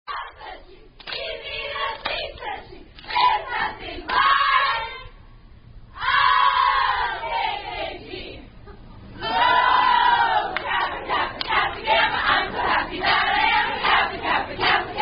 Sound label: Kappa Kappa Gamma Oh Pat, Variant Pronunciation And Ending In Chant